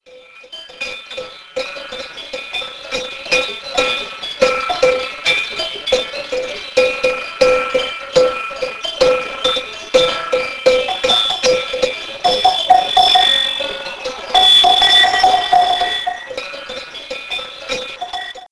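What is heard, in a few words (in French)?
clochette troupeau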